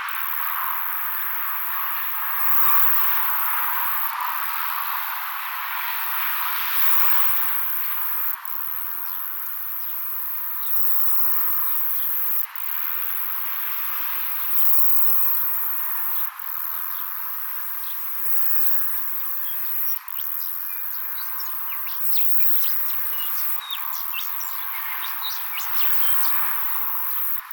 Myopsalta leona Willowvale

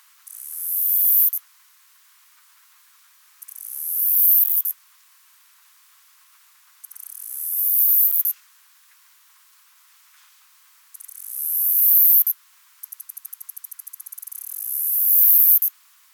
Myopsalta umbra; Hurdle Gully

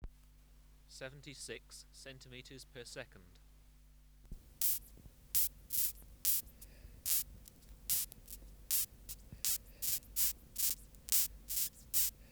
Mesambria dubia

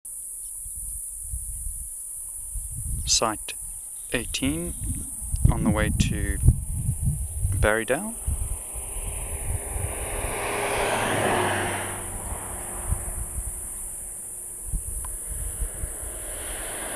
Platypleura catenata, Swellendam; 20km W Swellendam R324

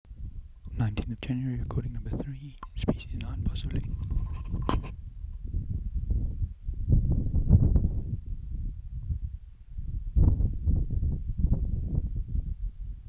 Albanycada sp n; Rietfontein Farm

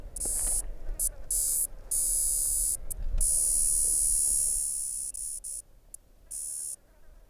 Pictila occidentalis; WA

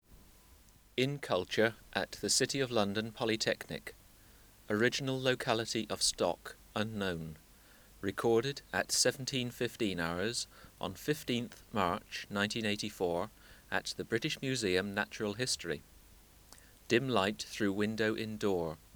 Teleogryllus oceanicus

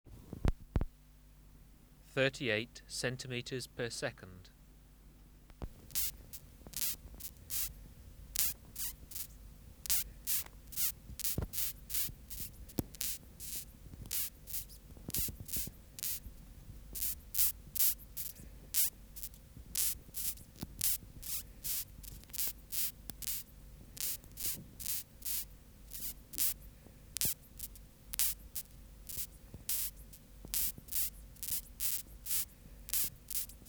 Mesambria dubia

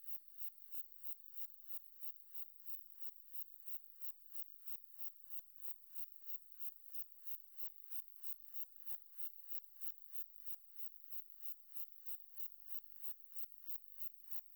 Symmoracma minoralis 15kHz HP

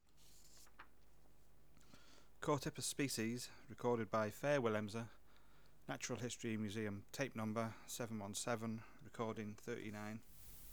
Chorthippus

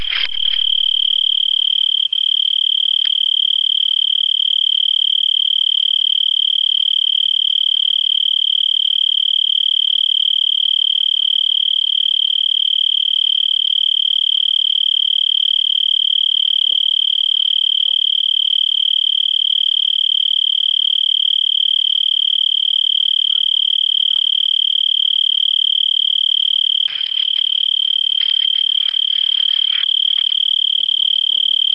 Oecanthus pictus, 20degree Celsius

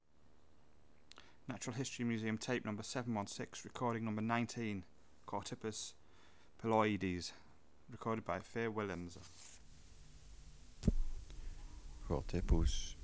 Chorthippus pulloides

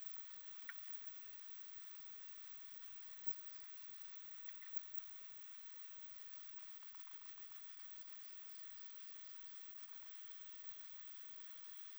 Rhinolophus refulgens 20kHz HP

